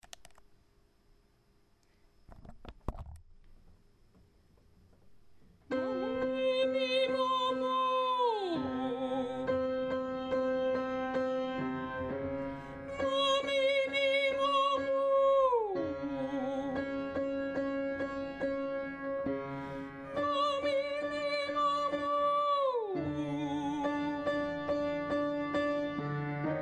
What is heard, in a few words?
Ma me mi octave slide, voice practice